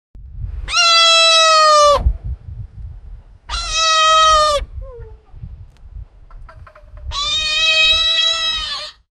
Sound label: lion; animal